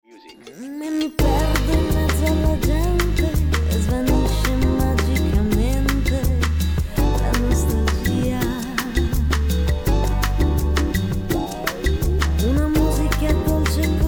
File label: lounge